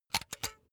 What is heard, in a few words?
razor retract comb; soundpool